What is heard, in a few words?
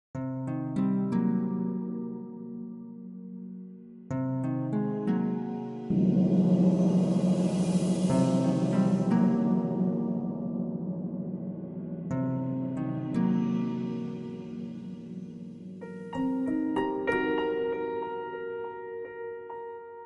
Pressure No violin; Jack Pressure